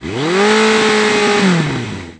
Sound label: turbo